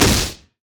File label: dragons rage hit; LoL; leesin; blindmonk